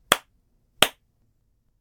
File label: clap hands twice